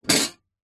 household teapot metal old pick up from hard surface